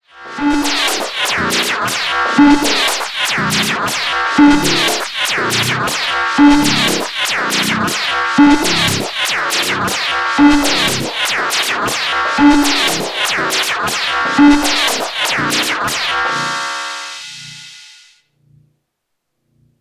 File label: Beat; Erica Synths Perkons HD-01